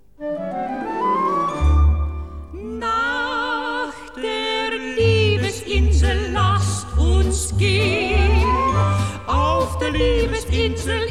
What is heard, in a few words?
Chor, Operettenorches